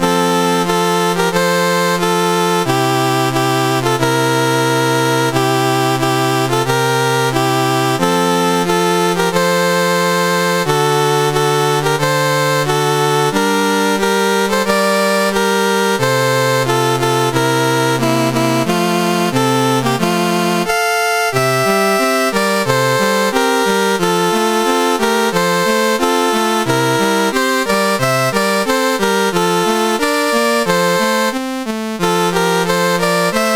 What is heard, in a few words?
CSG8580R5, National Anthems; New Antilles